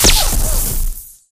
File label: arc titan hit; Titanfall 2